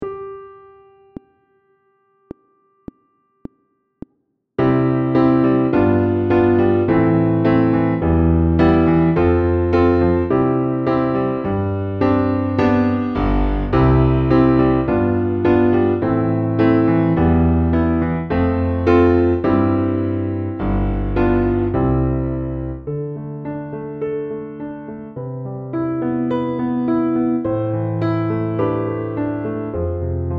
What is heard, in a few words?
Piano Only; Les 1 Oefening 20